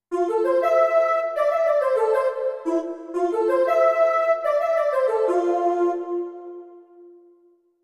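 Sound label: Voice Lead, digital piano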